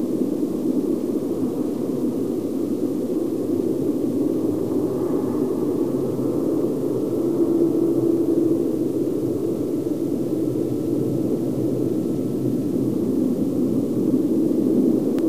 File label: desolate, sfx; dn3d r